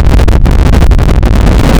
harsh noise

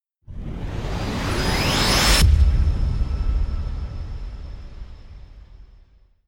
rise short; binding energy

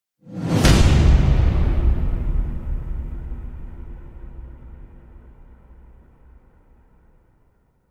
metallic slams with whoosh; wormhole

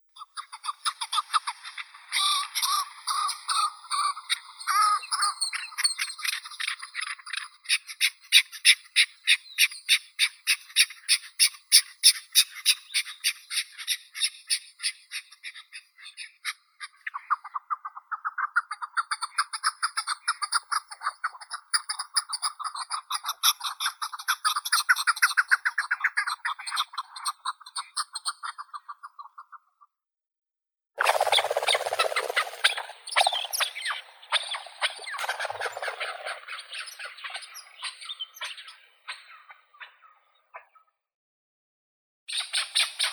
chukar, Alectoris chukar; keklik

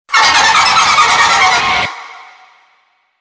scraper

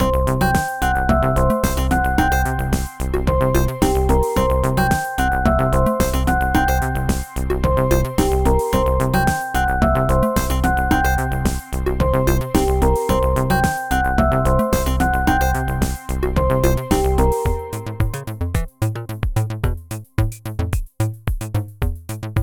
funky electro

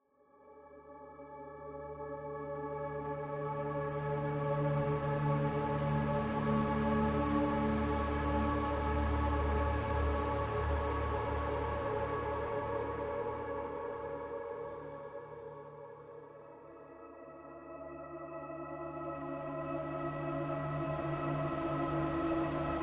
ambient

